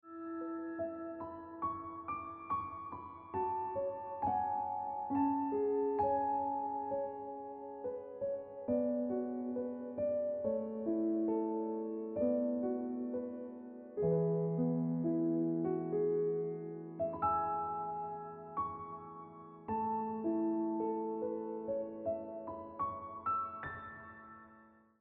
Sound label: Ringtone